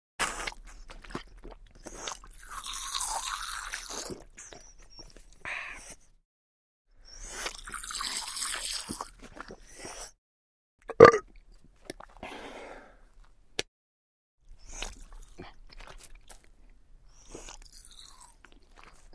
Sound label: Water Bottle Squirts / Swallows / Belch / Burp; Gushy Water Bottle Squirts Into Mouth, Refreshing Drink Sounds, Swallows, Belch; Close Perspective